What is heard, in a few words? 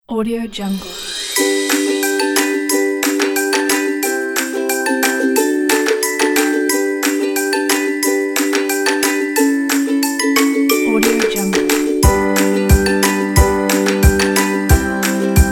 new year christmas party